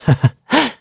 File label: Vox Laugh; Siegfried; kingsraid